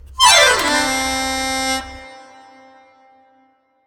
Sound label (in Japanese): ピアニカ かけ下がり